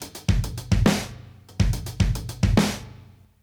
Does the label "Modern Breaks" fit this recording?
Yes